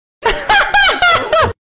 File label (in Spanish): risa